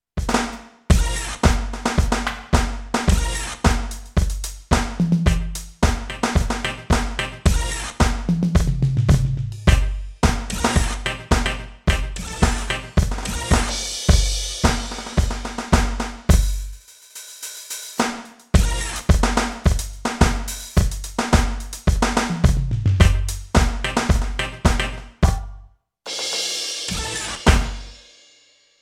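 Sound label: Alesis Crimson II Kit Hip Hop, drums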